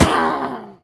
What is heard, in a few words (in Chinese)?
女巫死亡声